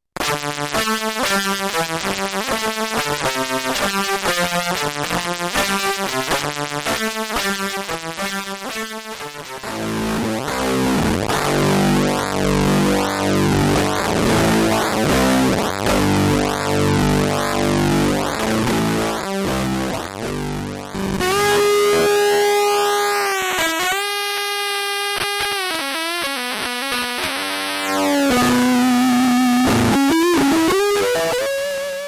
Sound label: pwm, guitar; This is what the PWM sound looks like.